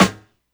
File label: verby snare sound f sharp key